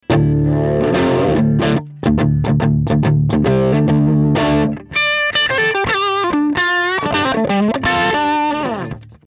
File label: Amplitube KM 84